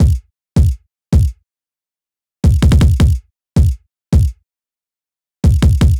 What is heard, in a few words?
Kick After EQ, hyperpop beat